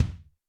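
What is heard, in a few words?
Drum Bass Drum Soft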